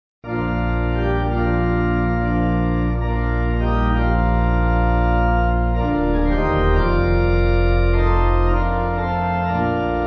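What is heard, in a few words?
Pipe Organ, Music Backing Files for Churches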